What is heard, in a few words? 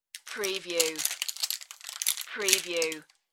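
Plastic Packaging Crinkle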